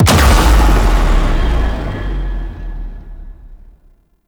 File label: Tenno Death Start; Warframe